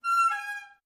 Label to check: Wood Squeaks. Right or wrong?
Right